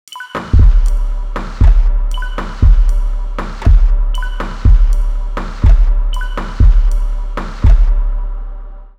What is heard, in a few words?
drum